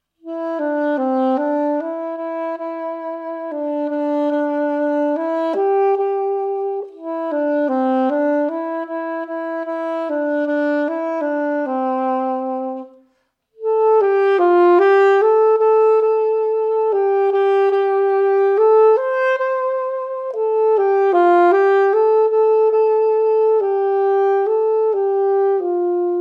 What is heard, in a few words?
Mary Had a Little Lamb with Modulation